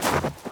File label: snow step; Golden Crayon Sounds